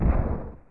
Building Shrapnel; Harvest Massive Encounter

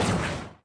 Tempest Launch; Harvest Massive Encounter

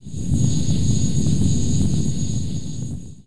Drop Ship Accelerating; Harvest Massive Encounter

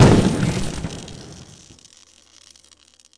Tempest Hit; Harvest Massive Encounter

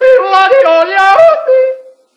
Yodel Goofy; pilot stimpack activate 3p enemy

